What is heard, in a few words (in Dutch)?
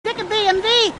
dikke bmw sound